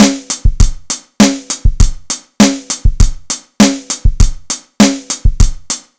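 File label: dangdut drum loop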